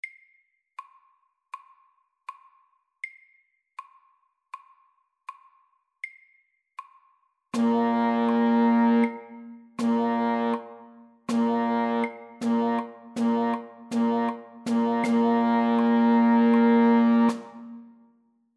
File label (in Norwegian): Rytme 4 slag i takten Etterslag SAKTE